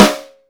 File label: SD O RIM